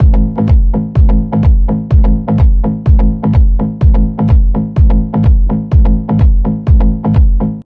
Synth Loop 126bpm

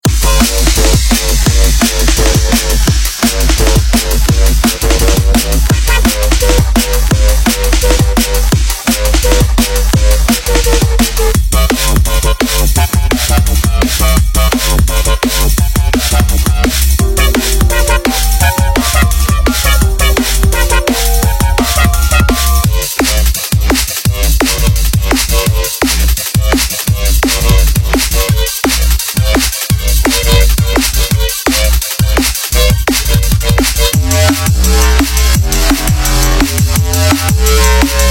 Jump Up DnB; Eksit Sounds

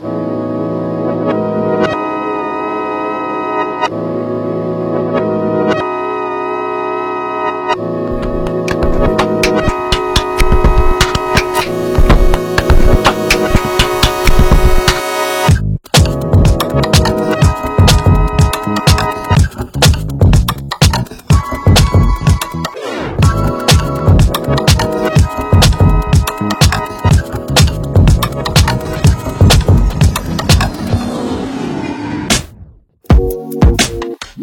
Nagra Tape Explorations; Sonic Collective

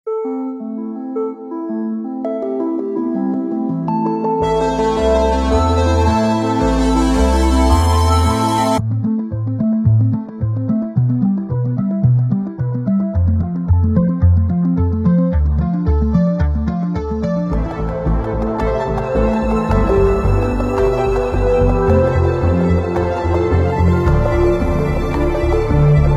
Arps; Output Arcade

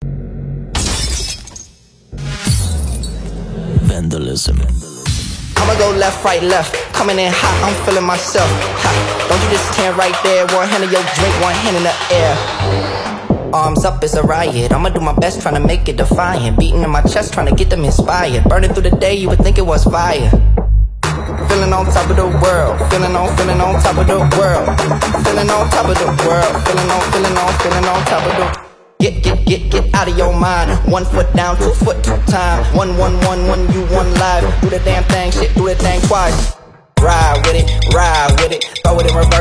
Bass House Vocals; Vandalism

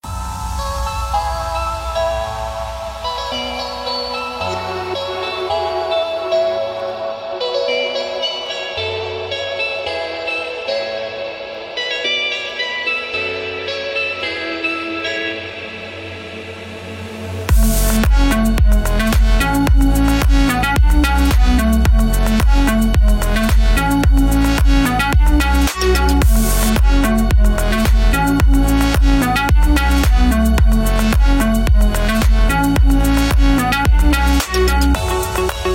Analog Dreams; Native Instruments

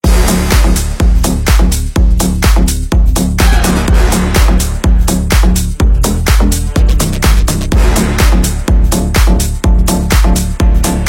Adventure Music; Power House Vol3

